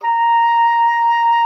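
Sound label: A#5, oboe d'amore; Xsample VOL-7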